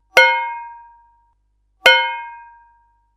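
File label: metallic clang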